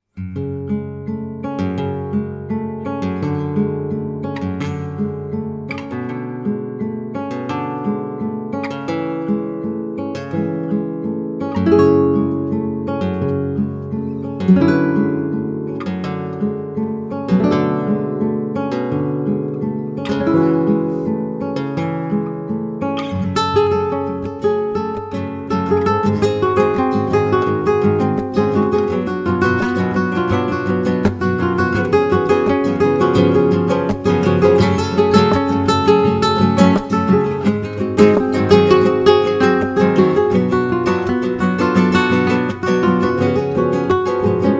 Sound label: Inspiration Guitar duo